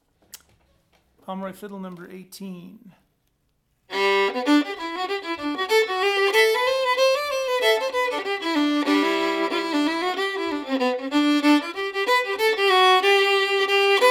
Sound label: fiddle